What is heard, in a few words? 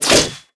Jewel Keeper Atk